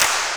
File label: CLAP, Dance Drums